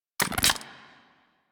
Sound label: Briefcase Launcher Rearm; Warframe; Weapons; Corpus